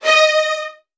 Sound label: D#2, Strings, VN 16TH; ILIO; Kirk Hunter